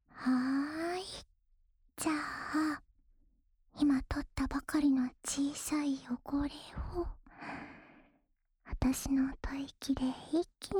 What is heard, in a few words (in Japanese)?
生の吐息で、くすぐっちゃうね？（右耳のお耳ふーふー）, ASMR